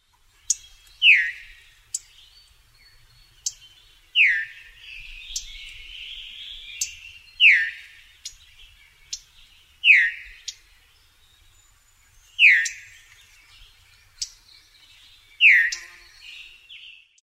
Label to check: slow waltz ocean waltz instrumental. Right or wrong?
Wrong